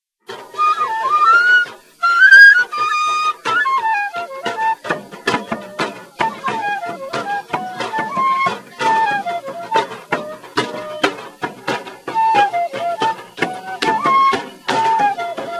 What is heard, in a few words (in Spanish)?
Flauta y tambores; festival luzmila patino